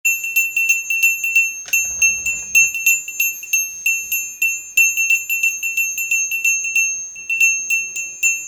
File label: Vocal